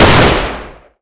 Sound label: deagle